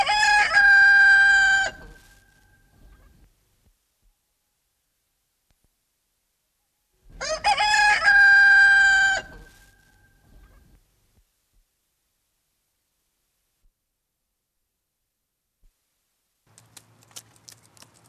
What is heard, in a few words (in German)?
Hahn 2mal